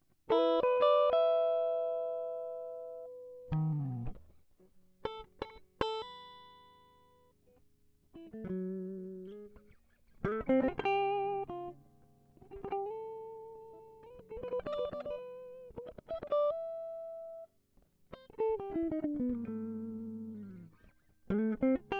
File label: Jazzee Moteef